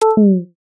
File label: tiny fail rim